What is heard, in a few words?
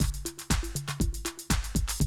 120, Classic Drum Loops